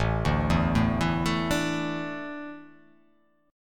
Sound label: A Suspended 4th A down, Asus4 chord; Asus4 arpeggiated; JGuitar